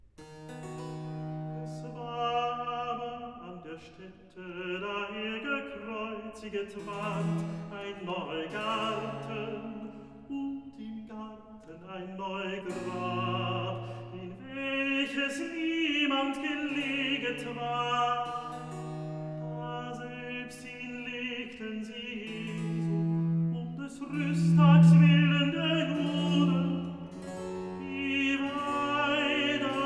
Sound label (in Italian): Recitativo; evangeist Es war aber an der Stätte; Klassika; Handel Masterworks